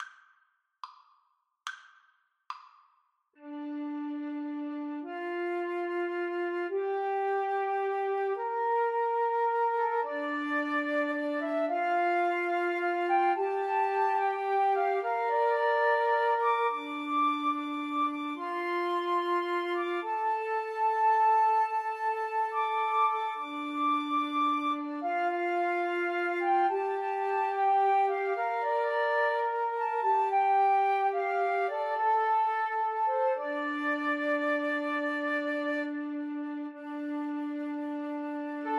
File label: house of the rising sun flute trio